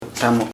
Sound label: conga drum